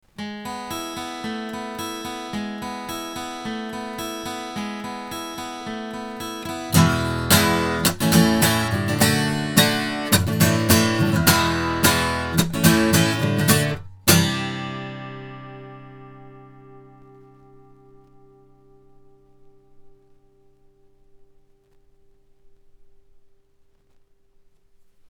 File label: guitar, microphones; Lauten; Horizon